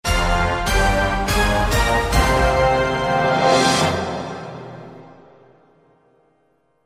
Commercial In; Millionaire Soundtrack; Russia

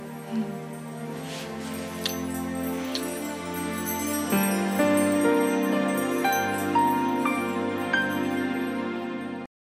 Confident Transition, Music

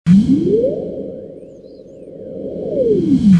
Switch New Question; Millionaire Soundtrack; UK Clock (2010 - 2014)

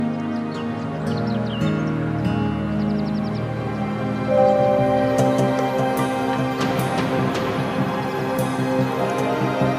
From Peaceful to Tense Transition

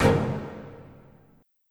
Error

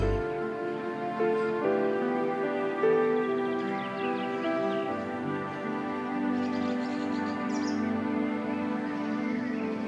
Piano Transition

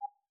focus change small; i OS; iOS10

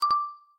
end record old; i OS; iOS10

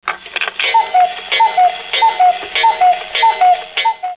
wakeup sound; braillesense

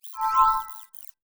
Windows Battery Critical

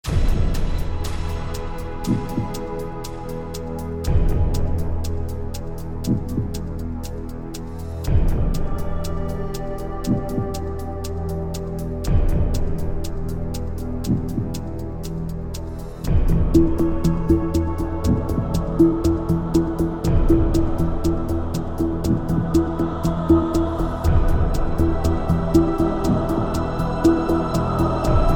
Clock; Millionaire Soundtrack; US Clock (2008)

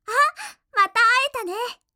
windows logon; Madobe Ai

